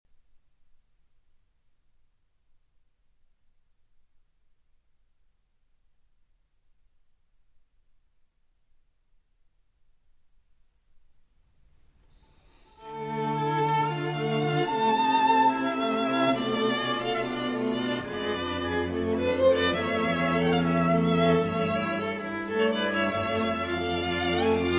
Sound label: String Quartet No. 2 in A Minor; Op. 51, No. 2; Brahms